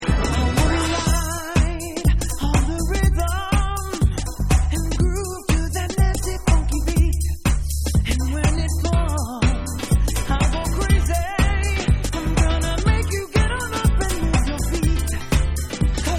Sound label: Funky